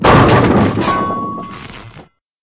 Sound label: drop bowling ball